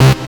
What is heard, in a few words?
SYN, Jungle; E-Lab; XTC Files of Jungle